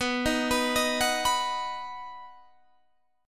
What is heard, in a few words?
B Major B down